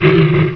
hunt, grizzly; wanted

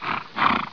horse; wanted

